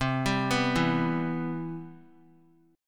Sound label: C Major 7th, chord; CM7 arpeggiated; JGuitar